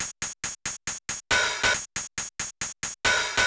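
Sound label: closed + open hi-hat, Techno 138 BPM; NEW BEAT ERA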